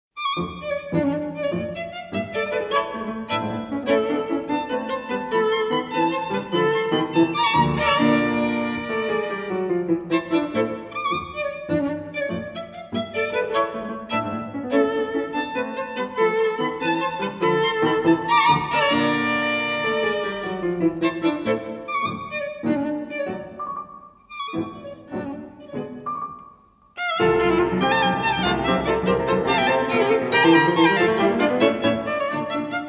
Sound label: trio; mendelssohn